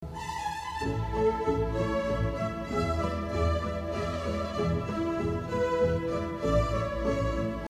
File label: Minuet, intervals